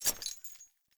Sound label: Melee Swing Chains; Characters; Enemy; Foley; Sinecure; Melee Grunt